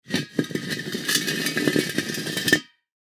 Propane Tank Small, Propane Tank Drag Scrape Cement; Machinery Sound Effects; FOLEY